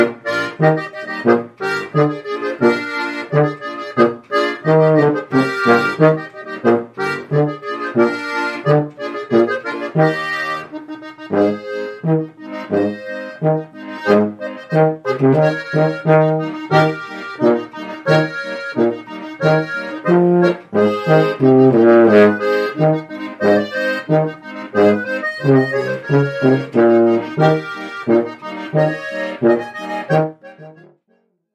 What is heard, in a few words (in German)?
boarischer